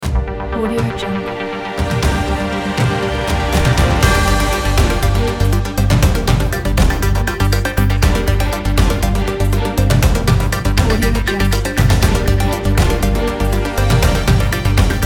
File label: primetime breaking news